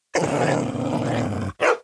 3D Warbeast Growl; Puzzle Chronicles

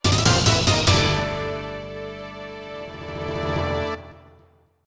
Jingle Galaxy in Danger!; StreetPass Mii Plaza; StreetPass Squad

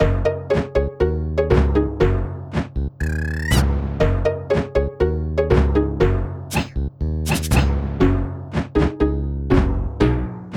Lobby Dojo; Cookie Run OvenBreak

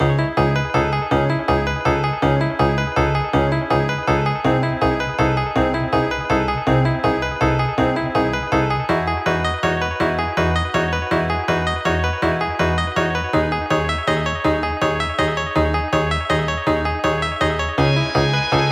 Dungeon; Tap Wizard RPG; arcane quest; android

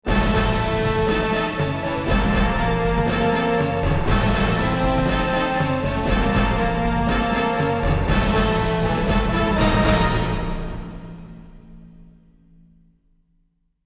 Ship Attack; battlestations midway

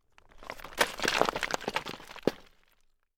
Rubble Fall

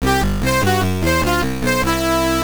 Failure; quiz marugoto the world 2 time machine ni onegai pc engine cd

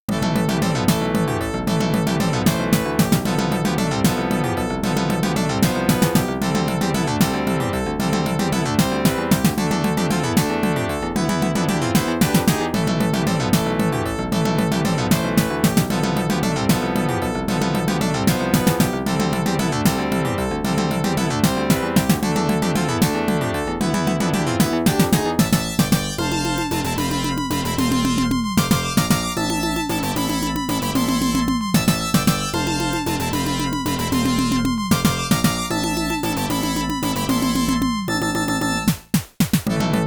BGM 3D Boss; Oretachi Game Center Zoku; Contra

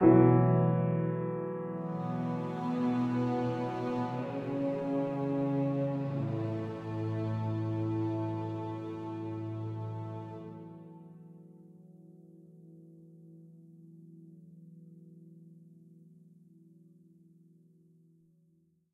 Piano

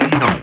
perc

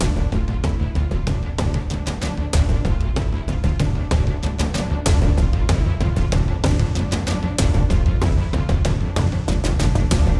Battle 2 Loop; bakugan champions of vestroia 2020; switch gamerip

